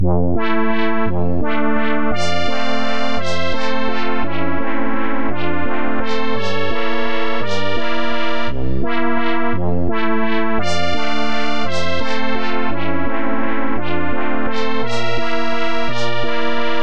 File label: waltz